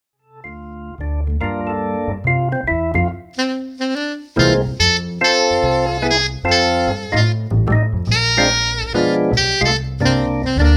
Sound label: Keyboard and Sax Jazz; Lyrica Demo